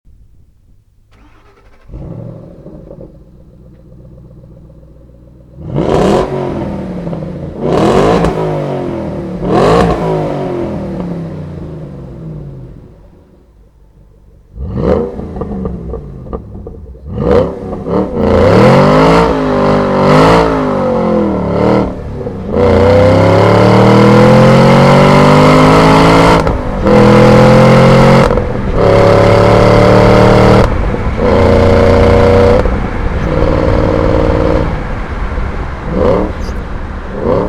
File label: 2013 Ford Mustang Shelby GT500